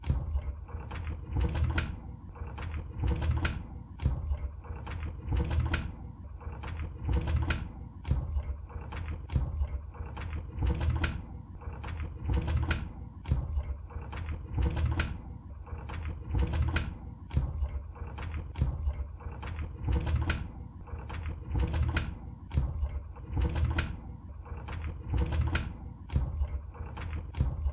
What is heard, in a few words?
carriage